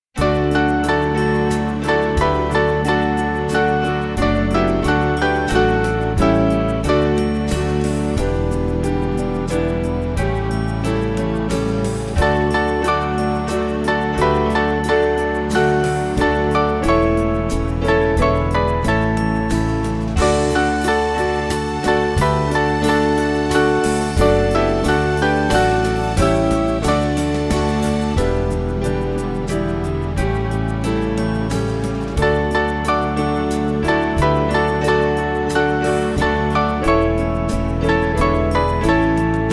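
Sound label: Music Backing Files for Churches; Hallelujah I Have Found; Hudson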